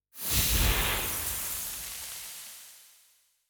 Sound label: spirit whoosh